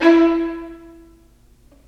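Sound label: STR, Roland String Master Series, Violins Marcato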